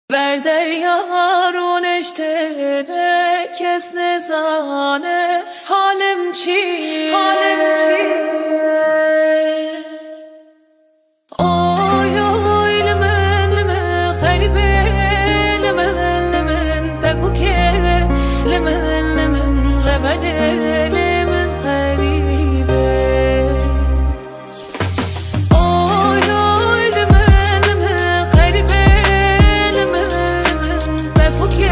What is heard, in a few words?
Kurdish trap remix